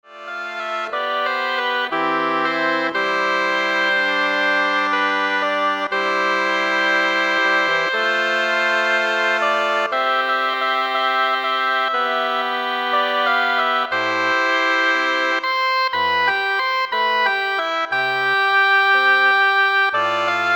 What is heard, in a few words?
Double Reed Ensemble